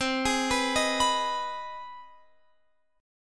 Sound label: C Minor Major 7th Sharp 5th C Guitar Open F; CmM7#5 arpeggiated; JGuitar